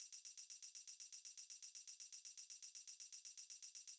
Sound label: House Drum OnlyHH Reverb